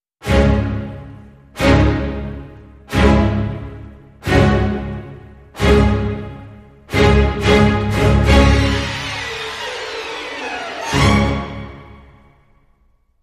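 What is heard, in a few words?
Hybrid Sting, Orchestra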